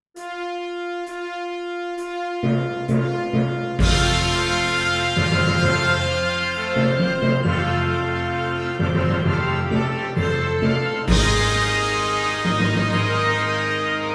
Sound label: Exercise